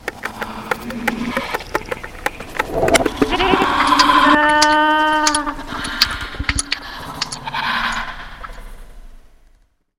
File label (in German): Glitzer